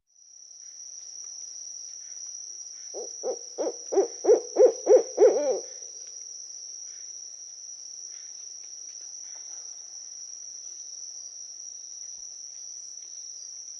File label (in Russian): zvuki sovy